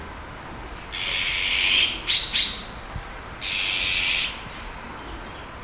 call Azure-winged Magpie Cyanopica cyanus japonica; オナガ